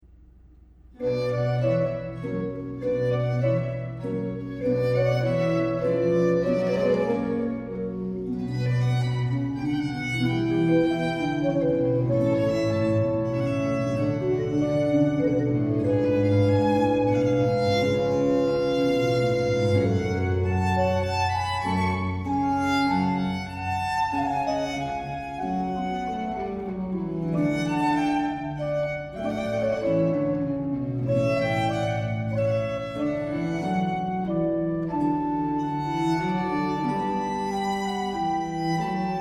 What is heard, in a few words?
Largo Concerto In C Minor for Violin Organ and Strings RV 766; Antonio Vivaldi